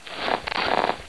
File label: crawl